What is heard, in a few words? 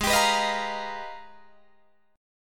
Ab Minor Major 13th, down, Guitar, DADGAD